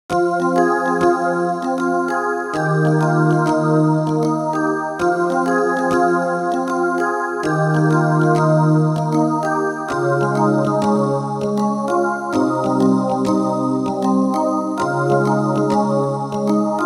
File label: Stacc; vintage synth roland srx keyboards; Heaven